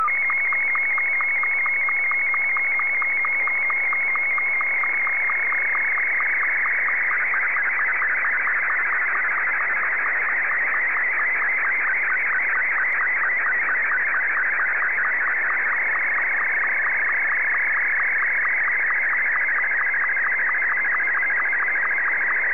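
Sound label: Martin 1, slow-scan television; VK3DFL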